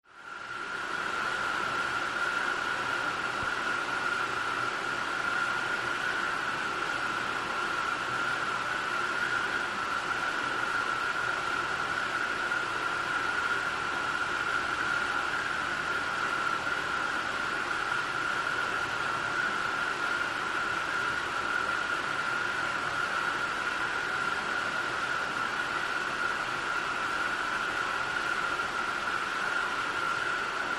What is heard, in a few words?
High Pitched Pump, factory